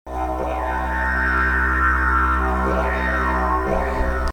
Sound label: Didgebox; Steffen